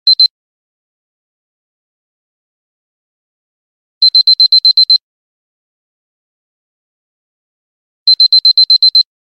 beep alarm

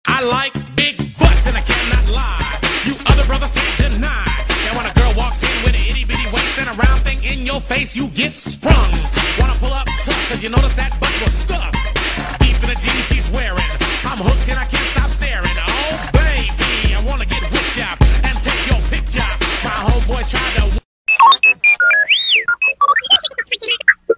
funny message

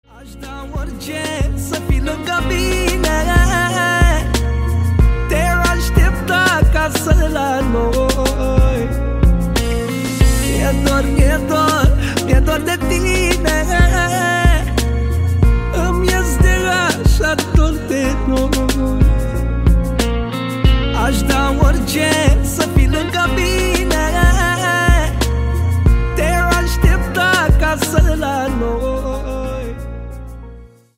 Sax

